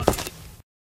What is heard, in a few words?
black tile, footsteps